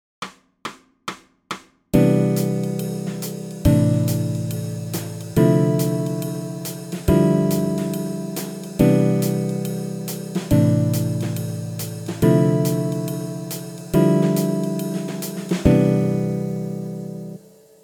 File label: 2 5 1 Extended, bass